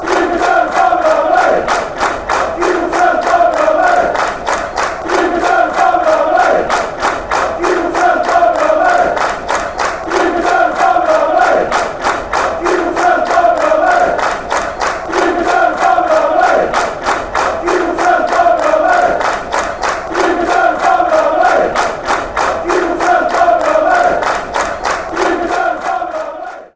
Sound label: de matteo